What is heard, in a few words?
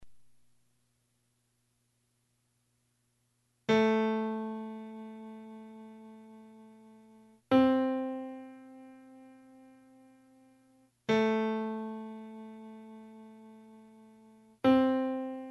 octaves